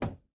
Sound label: throttle lim, aircraft; DCS